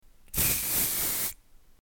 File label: deodorant spray